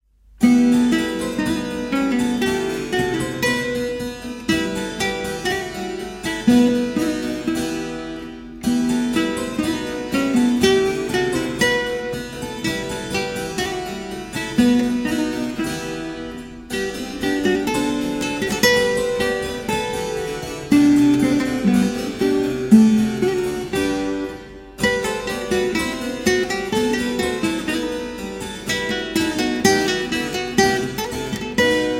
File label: Gavotta